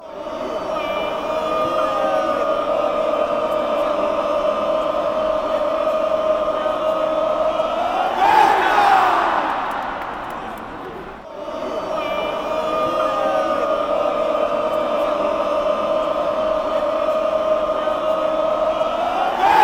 oooooooh goal kick chant

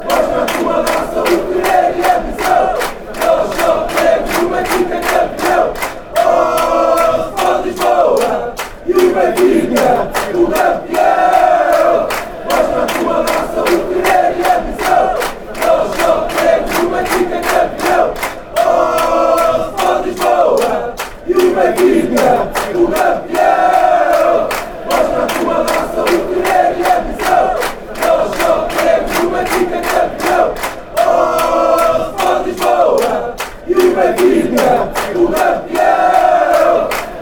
oh sport lisboa, chants

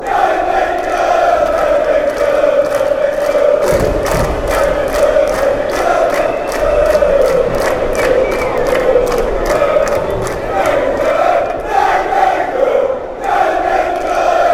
chants; nijmegen nijmegen nijmegen

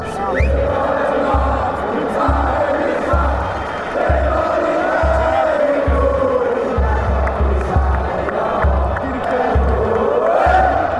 denmark chant

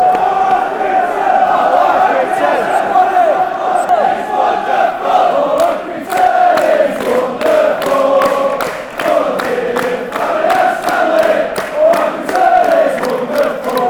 is wonderful, fan chants; oh accrington; Accrington Stanley

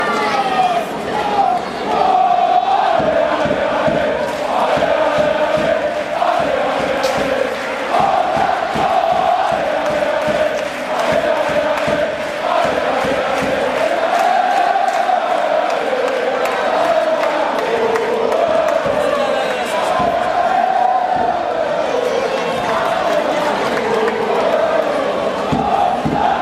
allez allez, chants; monaco

